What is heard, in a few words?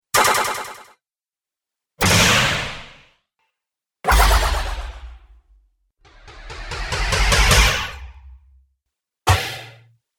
Percussive Hits, Sci Fi